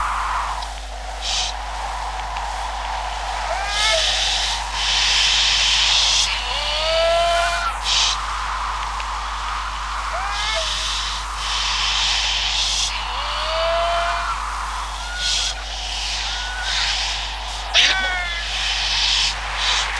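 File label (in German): Seelöwen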